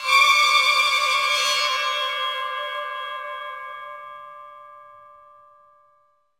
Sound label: Flourish, soundtracks; bolt 2008 pc gamerip